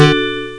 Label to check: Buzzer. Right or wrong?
Right